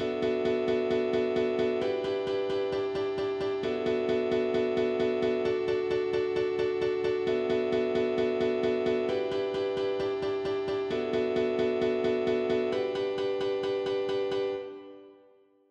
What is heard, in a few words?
Arp; 130BPM; stems; Pony Fan Music Remix Stems